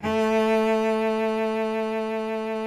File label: SOLO CELLO A2; Greytsounds VOL-1